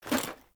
Foley Action Armour Melee Swipe; Characters; Player; Deora Armour

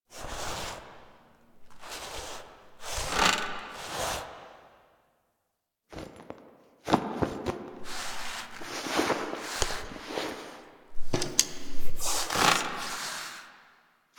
Foley; Zariman; Table For Two

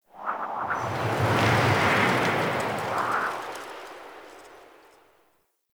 Medium Wind Gust; Wind Gusts

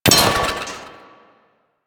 Glyph Target Hit; Warframe; Duviri; Glyph Puzzle

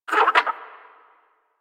Bird Captured Idle; Warframe; Hunting Critters; Sawgaw

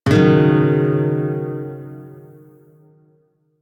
Shawzin Yo Chord D; Music; Warframe

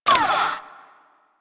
Security Camera Stop; Ambience; Warframe; Grineer Fortress

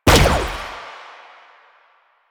Fire Shot; Duviri; Incarnon Adapters; Incarnon Sicarus Prime; Weapons

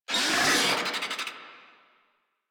Infested Charger Attack, Enemies; Warframe

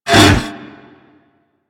Derelict Mover Malfunction Start; Orokin

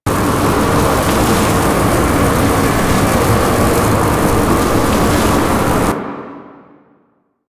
Void Dragon Firebreath Beam Loop; Enemies; Abilities; Warframe; Duviri

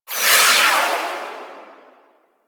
Artillery Barrage Projectile; Weapons; Powers; Warframe; Archwing

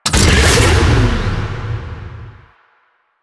Nitro Ability Start, Motorcycle; Motorcycle Ninety Nine

